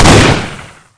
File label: calefactor fire